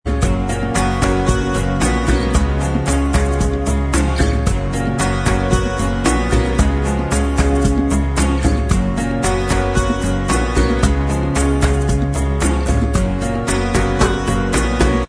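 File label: bossa version, instrumental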